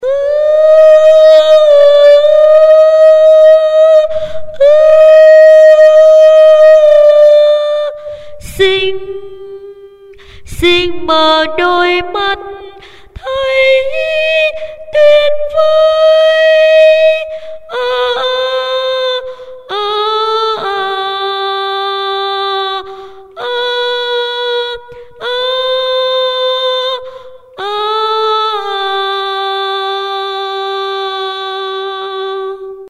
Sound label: tenor